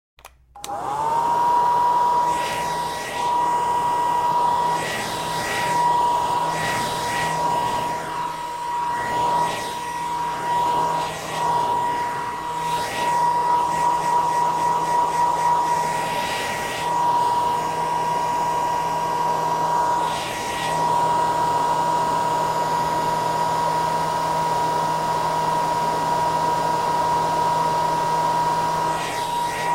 Blow Dryer Turn on & Dry Hair on Low Speed & Turn Off, Household